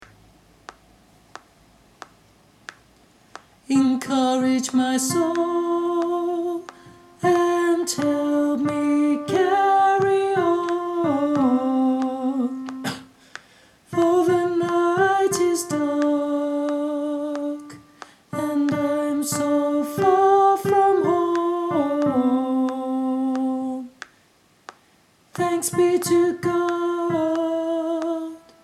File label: alto, gospel choir; encourage my soul